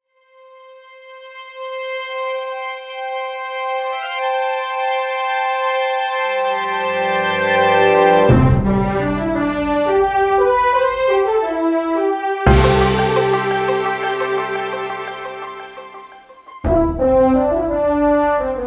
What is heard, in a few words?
End Game Cinematic; Sparklite